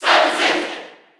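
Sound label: Cheer Japanese; Samus; SSB4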